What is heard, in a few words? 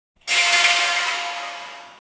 a short sharp metallic clank